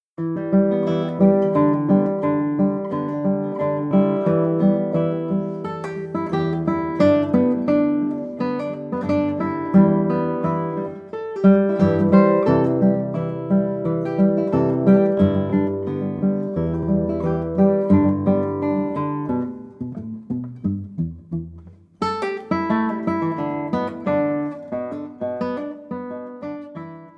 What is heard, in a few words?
mountain harp